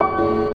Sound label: paphloum; Truth-Hz - 1-Hit KO Sample Pack